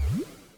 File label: splash; lexys-labyrinth; sound effects